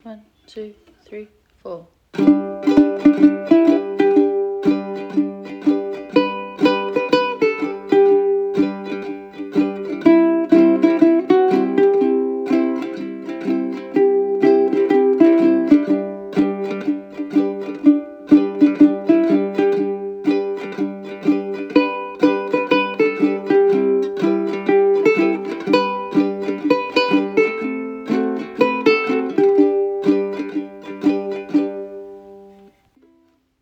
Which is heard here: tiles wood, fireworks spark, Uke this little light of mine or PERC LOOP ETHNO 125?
Uke this little light of mine